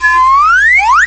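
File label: cartoon whistle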